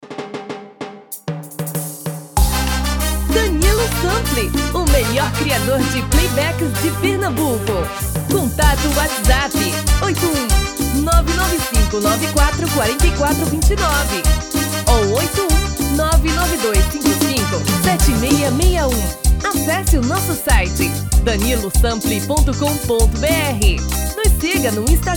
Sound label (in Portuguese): Cumbia